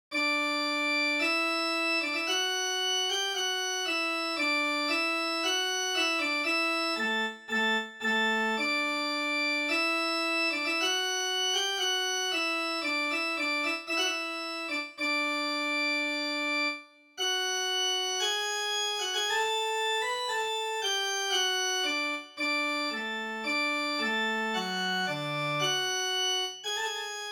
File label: trumpet voluntary; clarke; midi